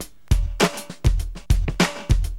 Drums, Acid Jazz Funk; LiveAct Pool

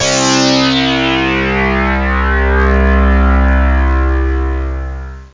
analog syn, keyboards; Zoom - Release 2; Active Software

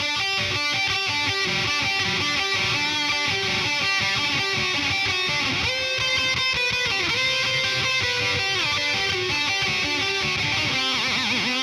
Guit; Metal Zone

